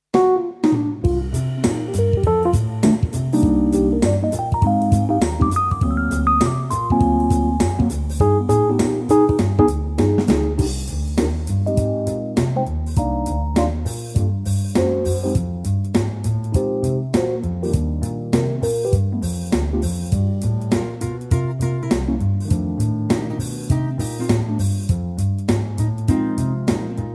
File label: groove; key - Cm - vocal range - C to D; Cool Livetrak groove arrangement